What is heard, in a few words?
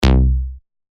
bass shot C; Watt; revealed bass shots vol 4